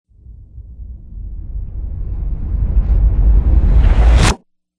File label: Production Music Trailer Tools Suckback; CATastrophic